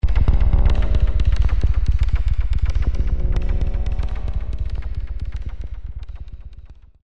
space alien contraption

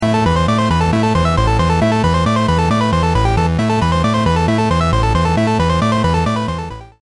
blast from the arcade past

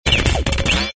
comm telemetry burst

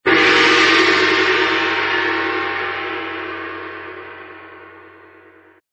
gong hit

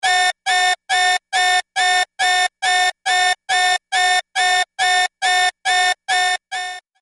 Electronic Alarm

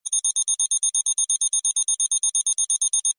beep thin electronic

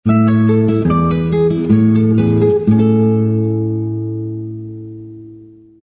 classical guitar accent bright

